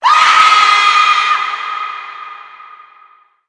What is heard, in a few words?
banshee scream, zombie; cs16; cstrike; basebuilder